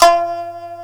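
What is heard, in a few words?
shamisen F2, ETHNIC; AKAI S-Series CD-ROM Sound Library VOL-8; SET#1 ETHNIC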